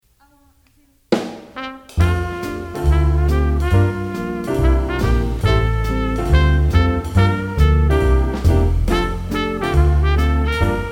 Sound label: Bass; all state jazz etude recordings